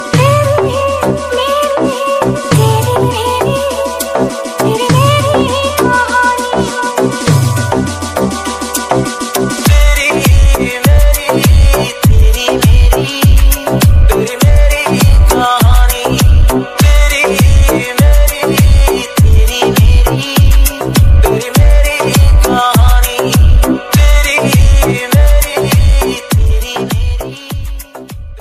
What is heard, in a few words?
english remix ringtone